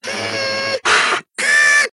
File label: robot leg moving